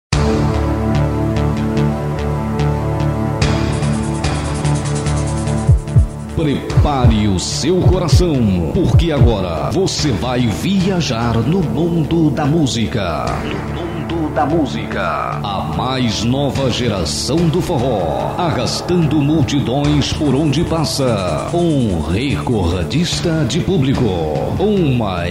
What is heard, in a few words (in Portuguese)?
vinheta, teclados